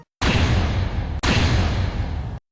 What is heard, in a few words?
not guilty